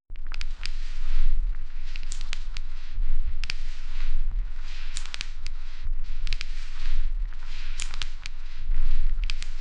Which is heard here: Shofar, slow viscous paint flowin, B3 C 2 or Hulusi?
slow viscous paint flowin